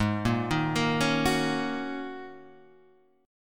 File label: G sharp 7th Suspended 2nd Suspended 4th G sharp Guitar Half Step Down